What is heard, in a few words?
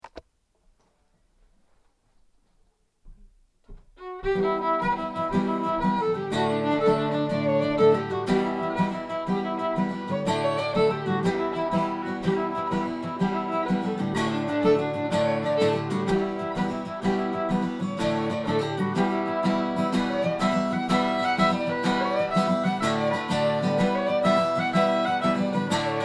Jig; The Swallowtail Jig